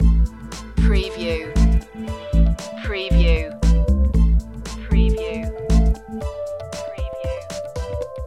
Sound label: packing system loop